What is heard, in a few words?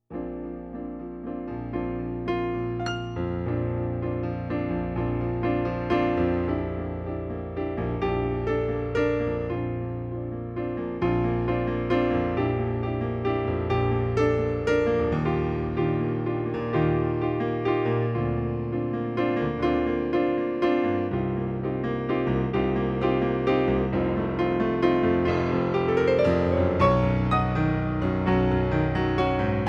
accompaniment